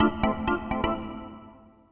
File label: SYNTH HOUSE 125 A, Keys; eJay